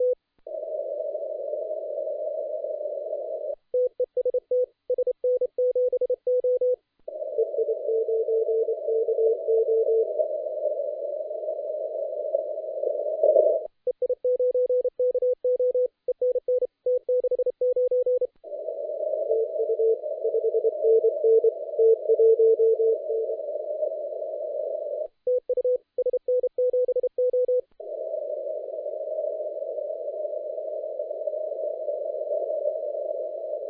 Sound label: CW 7MHz; contest; EI9KO de SN7O; TTC-SPCWC